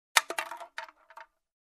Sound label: Empty can kick